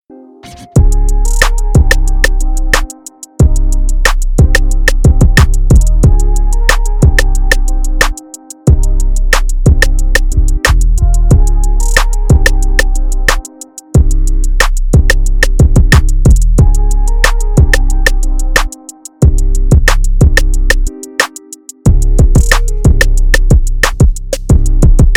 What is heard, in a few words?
Instrumental